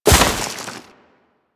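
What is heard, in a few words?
Crewman Step Vegetation, Footsteps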